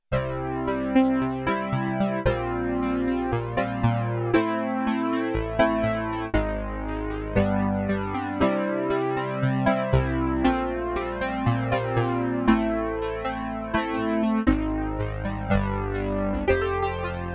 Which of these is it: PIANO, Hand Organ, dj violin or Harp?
PIANO